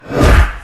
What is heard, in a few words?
zoom; breakdown